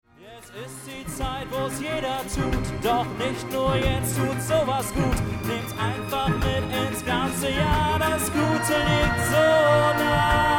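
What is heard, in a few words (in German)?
weihnachten für zwischendurch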